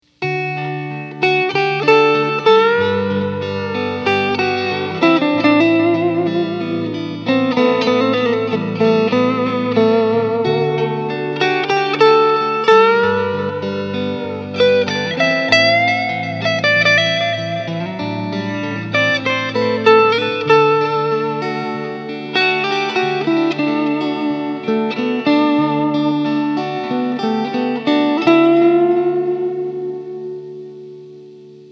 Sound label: solo; VOX Time Machine; Got a little ambitious tonight and recorded some clips of the VOX Time Machine this evenin